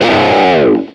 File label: dialog error; jam; stereo